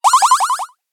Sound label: paranoid